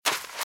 Footsteps Sand Dry Run Right; RadwinIsland

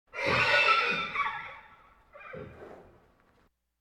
Animal effects snorts grunts farm ambience

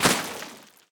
Earth Enemy Footstep; AudioWizards

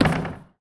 Street Ball Glass Back

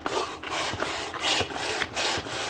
unflat; Quentin C's Gameroom

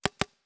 Paintball Gun; Sound Ideas; Guns

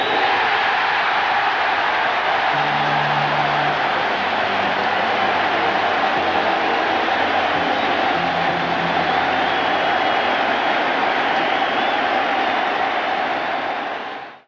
Baseball Crowd Cheer Organ; Crowds

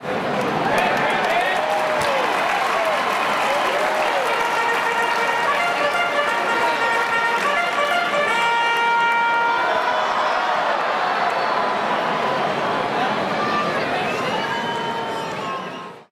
Baseball Crowd React Organ; Crowds

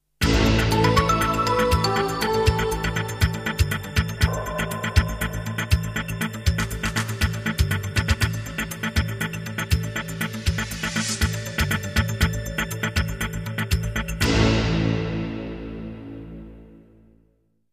jingles; 90 DDD Jingles

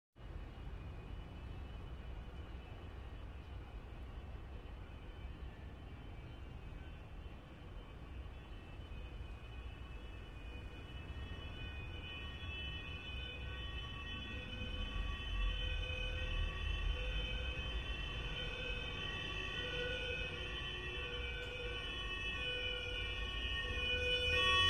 Police Siren Approach And Pass; Sound Ideas; Series 2000

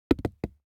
DICE on Hard Wood, Throw and Roll, Foam, One Die; Samples; ArticulatedSounds; DICE Sfx Party (Extended)

